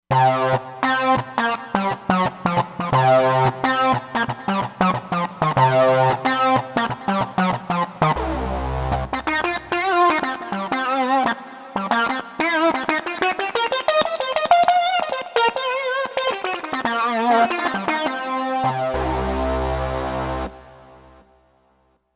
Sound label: Rezo Sync, Roland Fantom XR